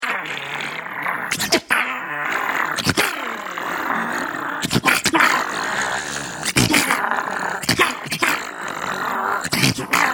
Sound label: Rabid Beast, Rabid snarling creature.; Miscellaneous Animal (Sound Effects:Animals)